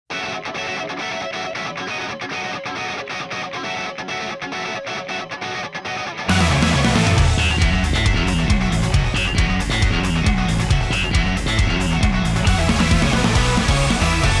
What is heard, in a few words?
inst